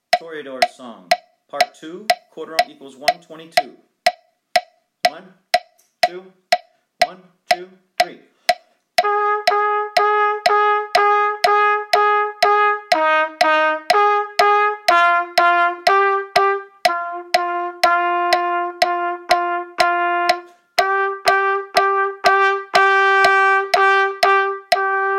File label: Easy Duets, qn=122, lessons Trumpet